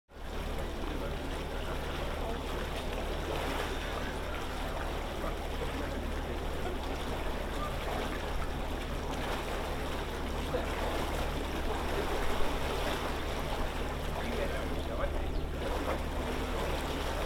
Harbour boat noise; whitstable

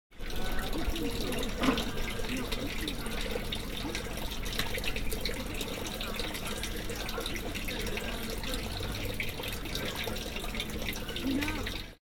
Drain near fishshop, whitstable

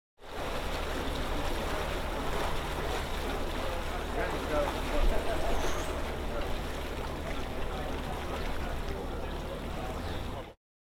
Harbour with boat noise; whitstable

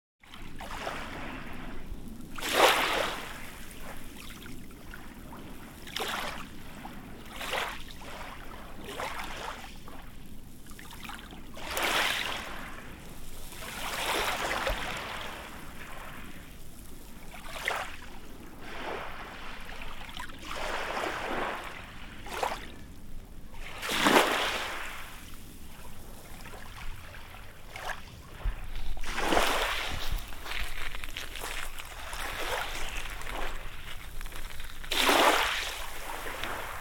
gentle wave - walking on shingle; whitstable

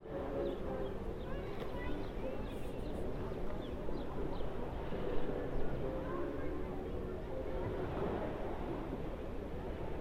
rockabilly beach; whitstable

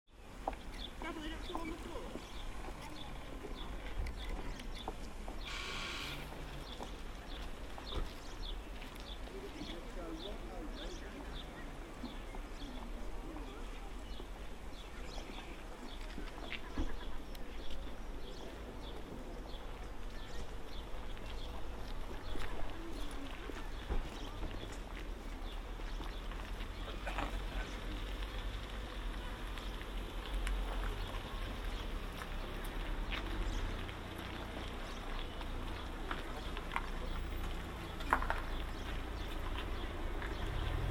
Near carpark, whitstable

